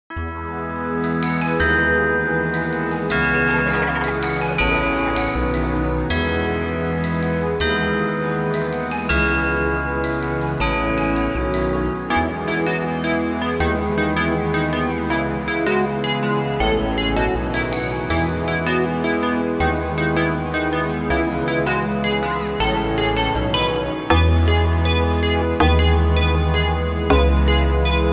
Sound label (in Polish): wersja instrumentalna; EduMuzArte